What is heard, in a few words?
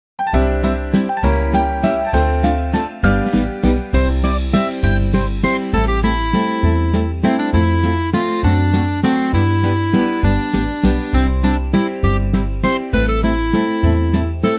Winter Christmas Waltz; ai social media music